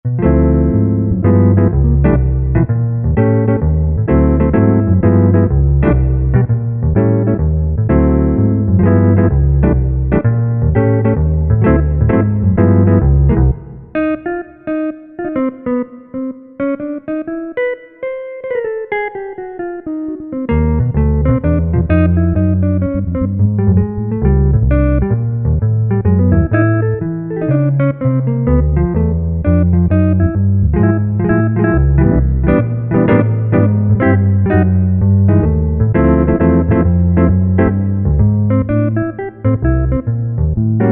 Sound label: Jazz Guitar, Korg TR-Rack